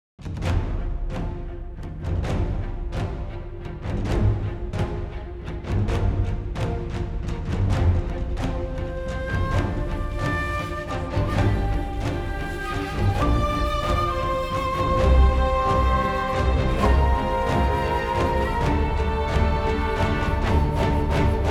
bgm lobby boost; Monster Hunter Rise; Xbox Series X/S

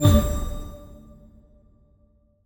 Ui Reward; Forza Motorsport 6 Apex

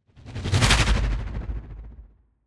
Stone Cyclone; Necro Stone Cyclone1

